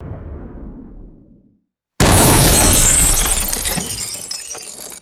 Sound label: medium explosion with metal and glass debris; explosions